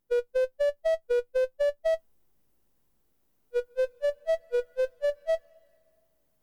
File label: Chorus